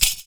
FOOT PERC; Univers Sons - Raricussions

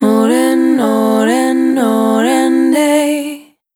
Dry, Multi-Voice Loops, Vocal Samples; A Scarlet Glow; Sonic Foundry (Sony Creative Software) - Pocket Diva World Class Vocal Samples

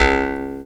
C# JAW, Primitive Instruments

